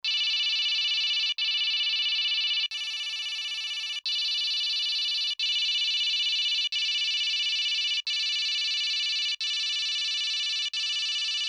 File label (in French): Sonnerie